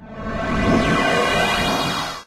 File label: teleporter receive; tf